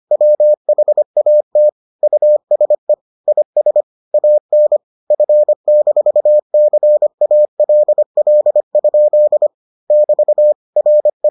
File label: morse